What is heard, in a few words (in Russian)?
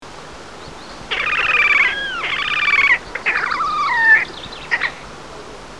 chernosheynaya poganka golos